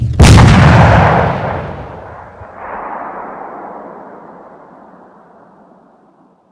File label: explosion bomb; SuperPower 2 Redux V7A; unit